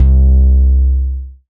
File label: Single Electric Basses, Dance Floor Bass; Best Service